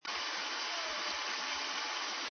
transient call

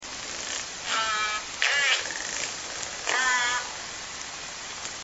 groans

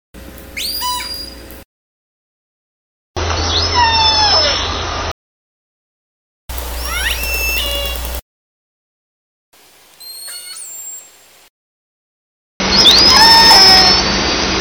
The 5 Sharp Sounding Calls, SRKW Orca Calls; Jeanne

